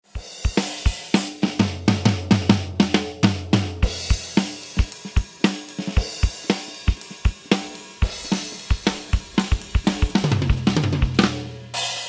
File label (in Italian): MIX batteria con e senza reverbero